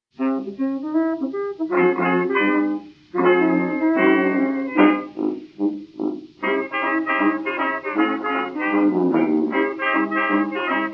Blues

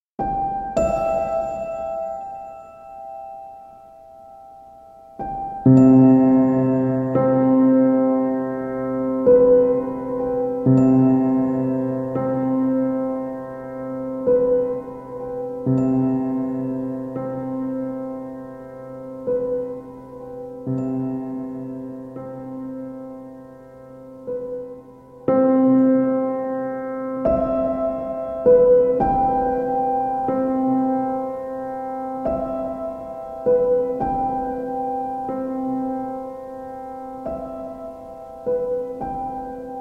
Piano Sounds

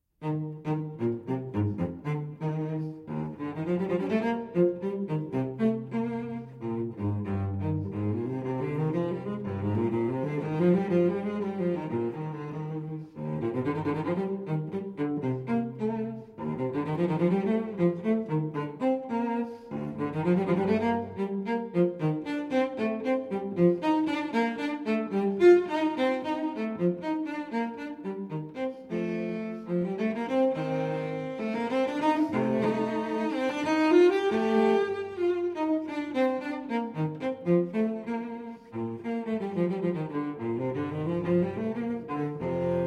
Cello Suite No. 4 in E-Flat Major Courante; BWV 1010

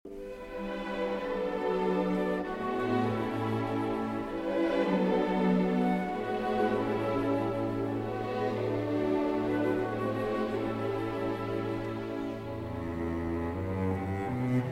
Cello Concerto, Lento; Delius

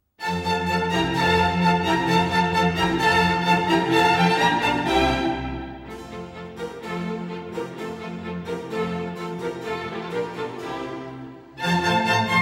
Violin Concerto in F Major, Allegro; The Four Seasons, Violin Concerto No. 3; RV 293; Autumn I.

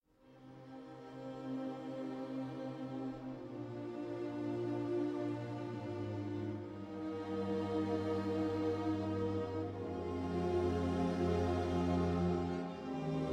Piano Concerto No. 2 in C Minor, Adagio Sostenuto

